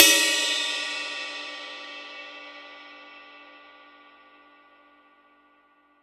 RIDE, Drum Dry; East Collexion; Drum 1 Dry

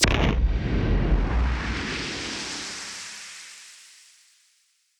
Noise Bomb; musicradar; bomb factory

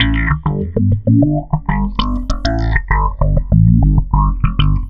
Processed Slap Bass, Electric Bass; Sonic Foundry (Sony Creative Software); Leo Cavallo Bass Taster

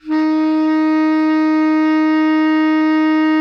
BARI PP D#3, saxophones; Greytsounds - Philip Wolfe David Crozier's Saxophones

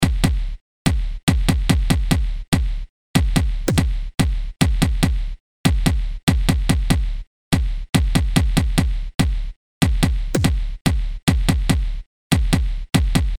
Click Kick; Industrial

